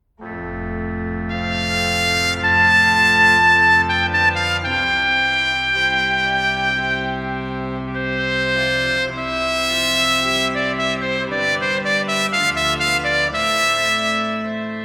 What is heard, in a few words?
Sonata Prima per tromba sola, organo e tromba; Adagio; 'Capricci armonici da chiesa e da camera' Op 4; Sonate per organo e tromba